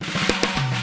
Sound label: mega gate fill, DRUM LOOPS and BREAKS, FILLS and ROLLS